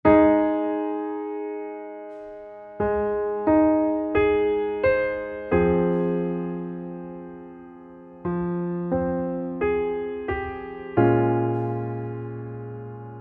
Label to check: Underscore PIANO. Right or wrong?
Right